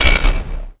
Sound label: Noise Hit; Drums & Drones